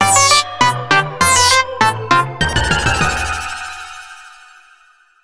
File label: Jingle Failure; Travel Adventures with Hello Kitty; 3DS